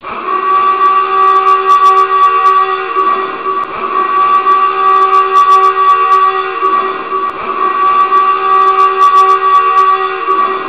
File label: Submarine Dive